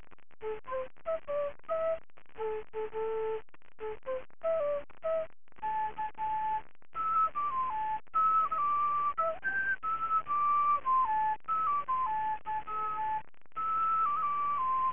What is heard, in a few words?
quena